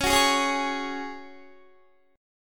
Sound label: Db Suspended 4th Db, Guitar; strummed; 21,21,18,18,20,20